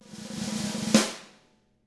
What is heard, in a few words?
snare roll